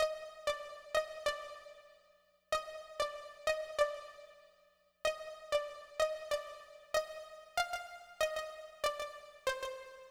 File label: Synth, 095 Cmin